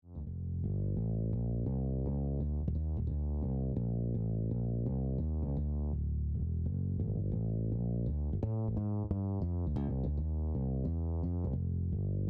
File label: bass compressor